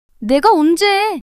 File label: surprise